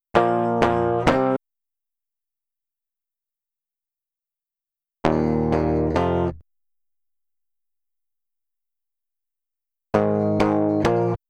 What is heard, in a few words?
guitar direct; mcdsp